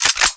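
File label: Bolt release, weapons M4A1; COD4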